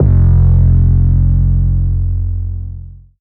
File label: 808 F; Cymatics